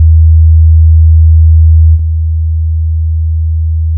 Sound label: soundproof effect 80hz; ノイズクリアの防音効果